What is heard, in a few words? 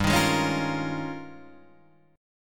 D sharp 11th G